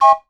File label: TRAIN W, WHISTLE; Voice Crystal - Steve Reid Definitive Percussion; ASRT WHISTLE